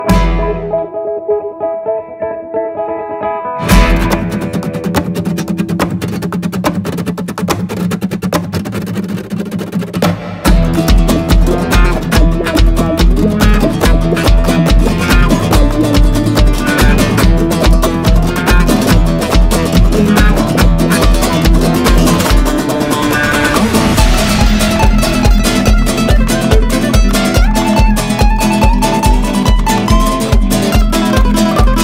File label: KIDS Routine Set music; artistic swimming